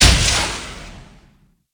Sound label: JAVELIN